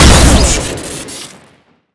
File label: railgun shoot crit; tf2classic